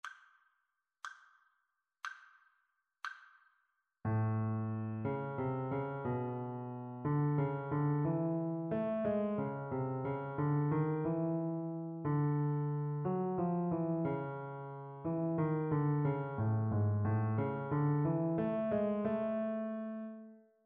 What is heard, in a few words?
melodic dictation; eartraining